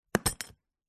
industrial toolbox heavy duty plastic metal latch open; zapsplat